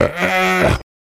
Hyena Gasp

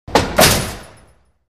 Bowling Ball Rolls

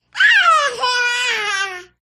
Baby Girl Delighted, twenty month old

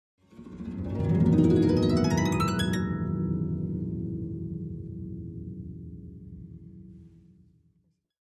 Harp Ascen Gliss 1; strings harp

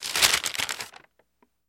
Paper Bag Open; paper mvmt

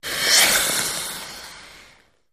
Ice Skating Scrapes

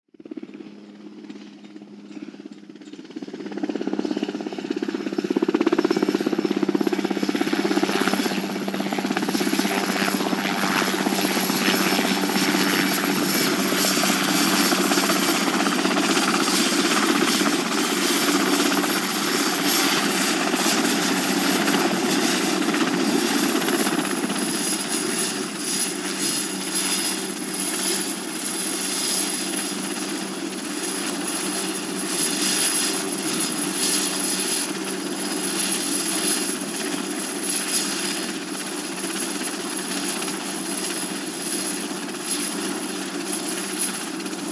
Heli Trffc Apprch Lan, traffic

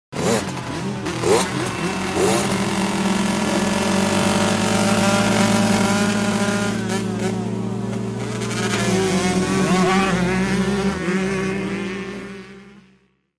Motorcycle 250, motocross

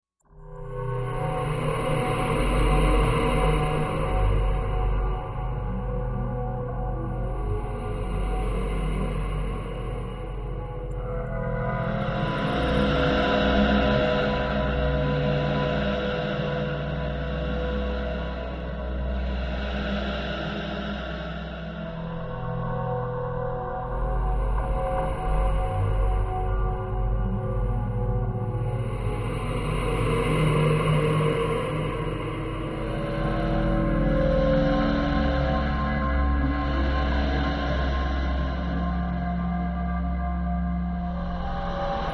Waves Dread Low Hollow V

